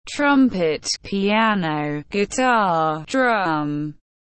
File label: Piano guitar drum Lento